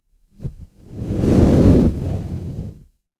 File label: fire electric whoosh flame